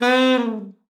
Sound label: sax c4, Saxophone slides; Session Instruments